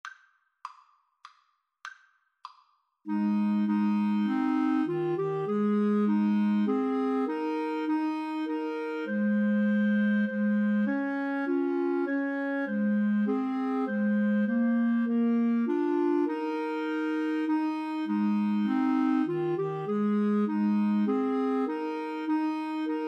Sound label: Clarinet Trio